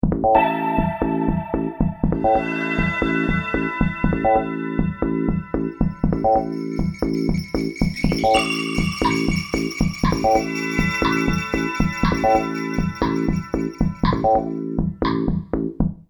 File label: bass att